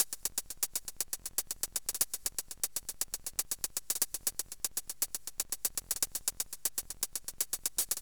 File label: hihat; recreational research foundation (drum)